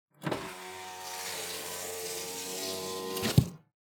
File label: car windows up